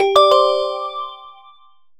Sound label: Device disconnect; Linux